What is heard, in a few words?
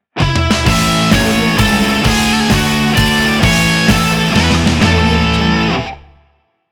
happy birthday punk logo